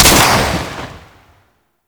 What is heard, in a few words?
Swarm Missile Launcher Fire; Warframe